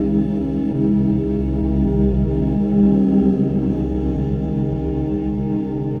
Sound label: VOICEFX ETHNO 160; eJay